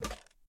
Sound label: Ramen Drop; Game rip; MiSide